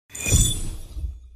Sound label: line sound; casino; corgipharaoh